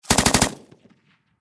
Warfare Machine Gun 7.62 Caliber Pkm Russian Short Burst Distant Perspective; Machine Gun Sound Effects; SFX Bible 6